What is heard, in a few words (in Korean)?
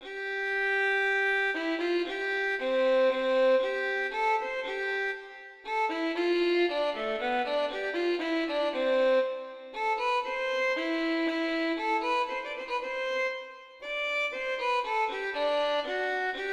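바이올린소나타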